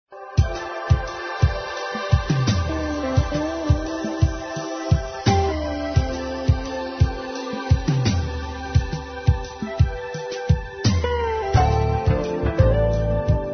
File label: jazz smooth